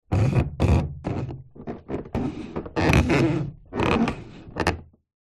household television flat screen slide movement adjust position on table